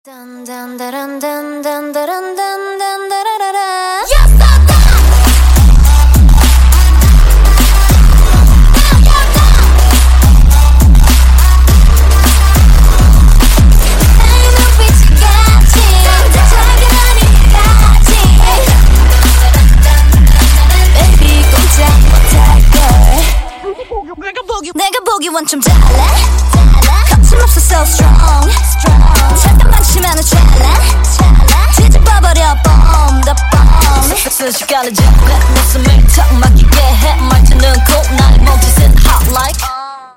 bass remix

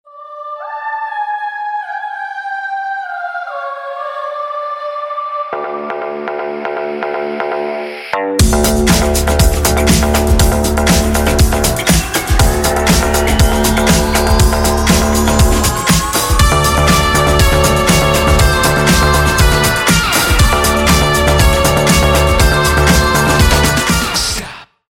instrumental

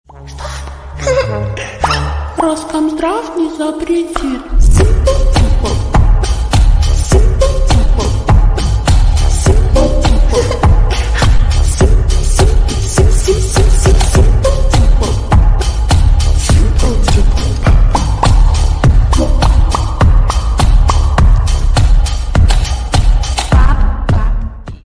slowed remix; tiktok

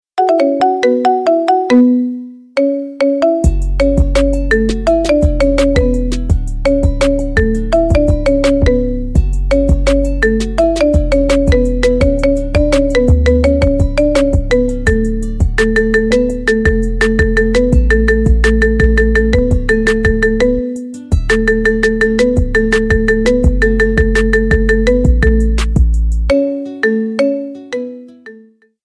marimba remix

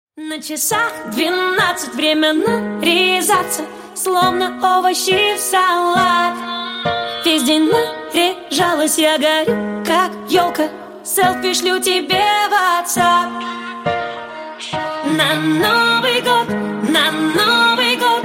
karaoke intro

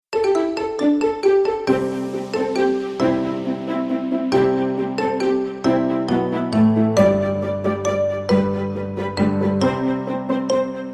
marimba silent night marimba remix

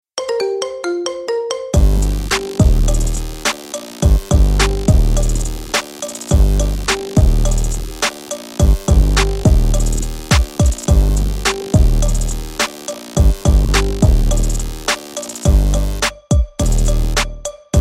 marimba remix

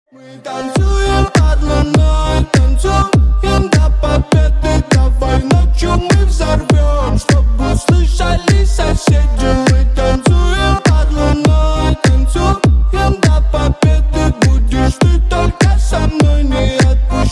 piano

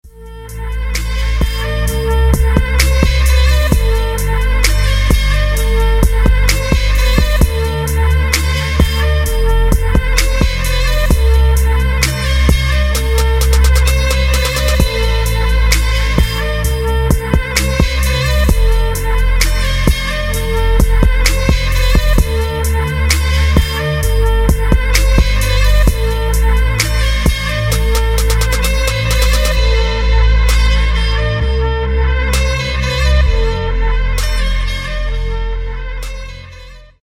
arabic trap

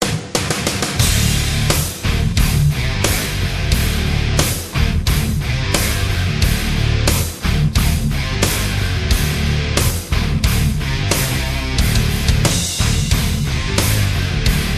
instrumental

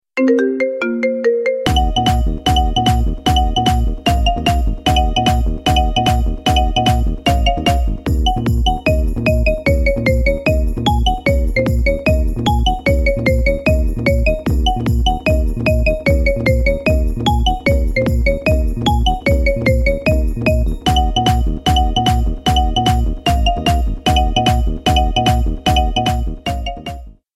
marimba remix

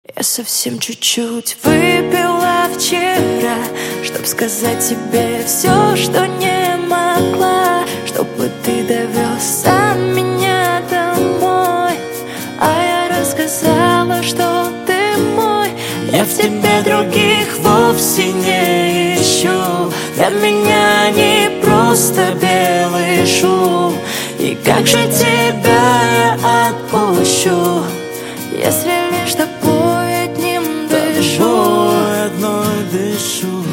acoustic version

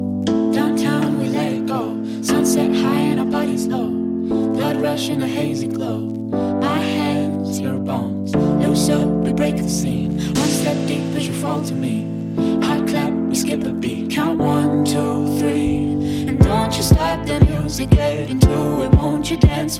remix

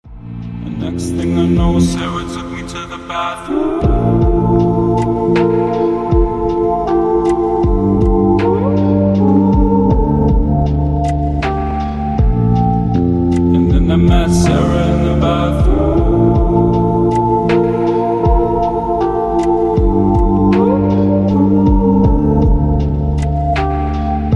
slow reverb

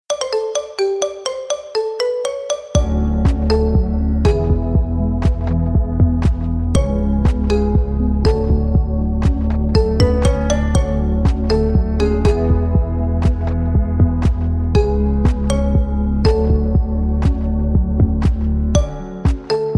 marimba remix

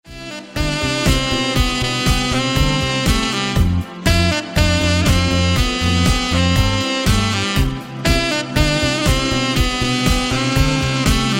sax cover

